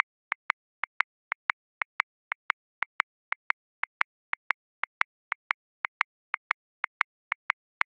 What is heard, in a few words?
Blues Shuffle, Grooves; Drum Booth; Ableton